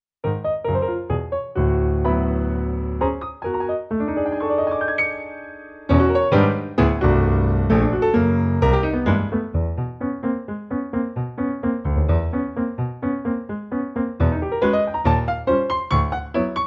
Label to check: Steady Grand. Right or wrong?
Right